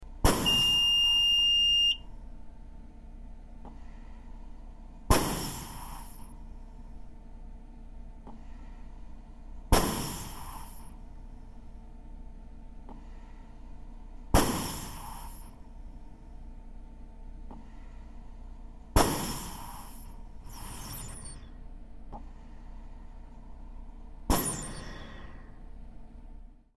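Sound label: Respirator Interior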